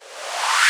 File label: VFX Short Fills & Reverse